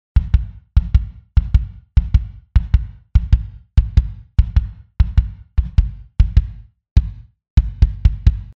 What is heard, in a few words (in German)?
KickDrum Bearbeitet; mixing mastering